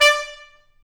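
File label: brass trumpet stab; Roland L-CDX-03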